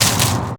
Tree Branch Perc, percussion; Lindale; HOUSTON

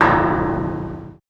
Esplanade Sign Perc; Navigation; Houston

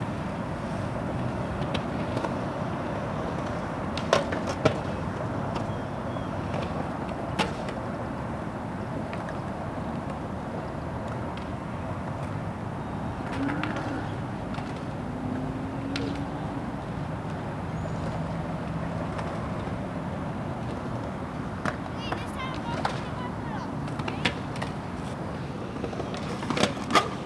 Skate Park Ambient; Jamail

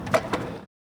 Skate Park Skateboard, percussion; HOUSTON; Jamail

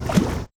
Allens Landing Water Splash; First Ward; Houston

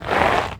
Park Gravel Scrape; Hermann; Houston

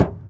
Ticket Counter Perc; Moody Park; Houston; percussion

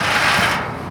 Castle Sculptures, percussion; Downtown Houston Root Memorial Square

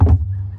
Garbage Can Perc, percussion; Houston; Moody Park

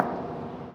Toyota Center Hakeem Bronze, percussion; Downtown Houston

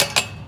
Park Baseball Backstop Perc; Moody; Houston

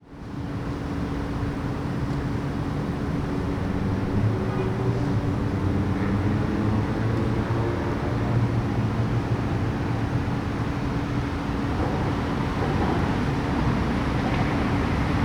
Emancipation Park Ambient; Third Ward; Houston

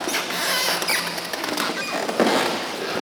Floorboards, percussion; Menil Collection; HOUSTON

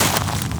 Park Footstep in Leaves; Moody; Houston

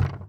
Ticket Counter Perc; Moody Park; Houston; percussion

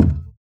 Moody Park Playground Perc; Houston; percussion

